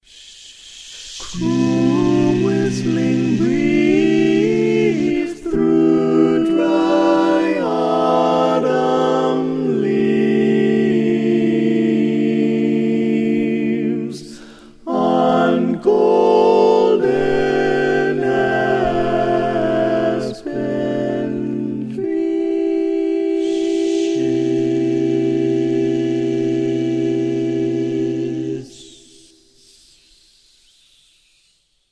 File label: All Parts, barbershop